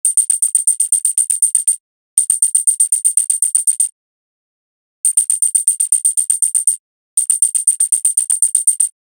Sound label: tamb